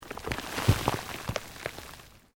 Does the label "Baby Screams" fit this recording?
No